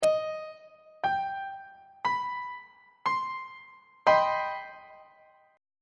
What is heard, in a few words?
b sharp minor major 7th 1st inversion on treble clef